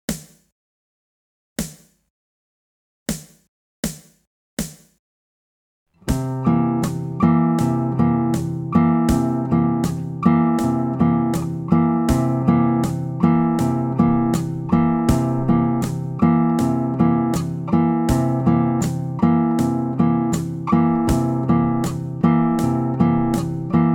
string clicking langsam

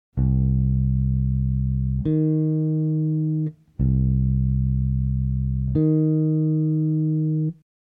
kleine dezime

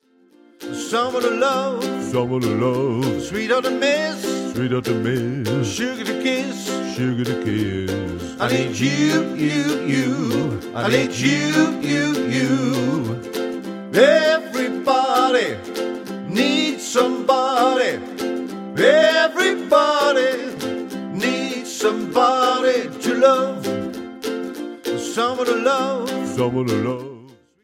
ukulele gesang

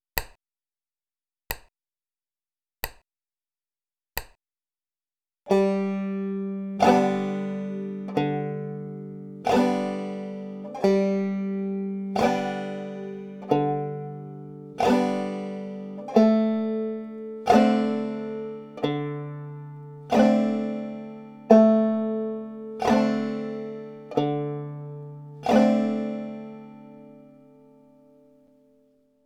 grundanschlag mit wechselbass

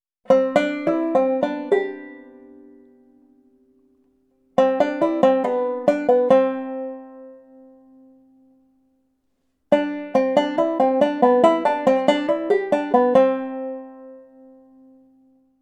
nail gliding mit Greifhandtechniken

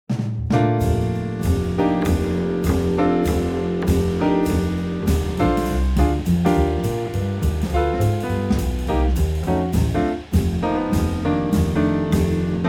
rhythm changes mit f pedal

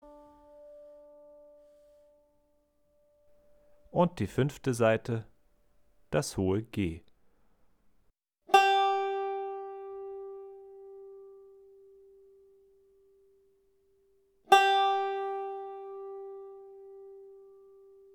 die banjosaiten

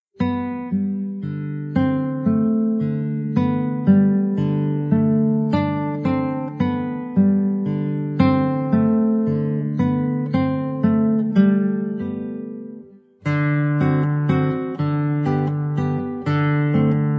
walzer g dur; fernando carulli

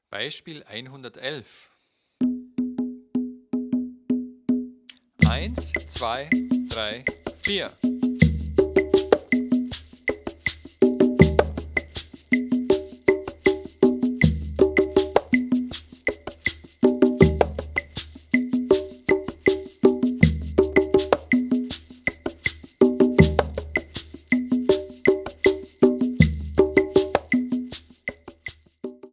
viererrhythmus

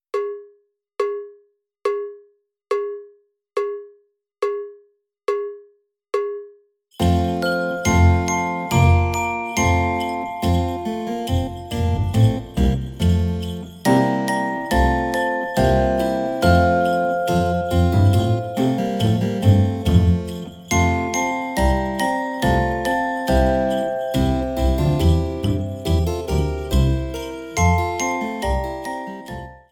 morgen kommt der Weihnachtsmann slow

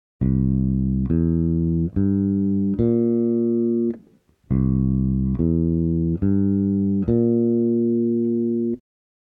dominant septakkord

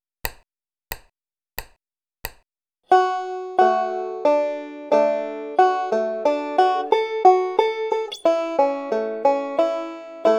skip to my lou D Dur

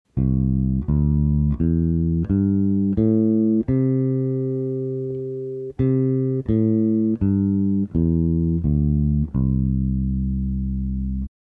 dur pentatonik

